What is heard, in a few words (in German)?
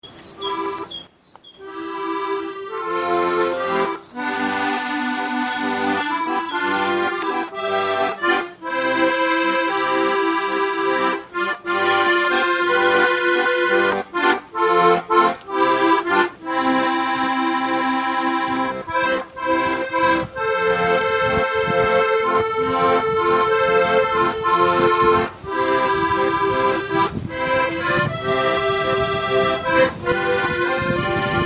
Forsterliesel Canon tune; Suntel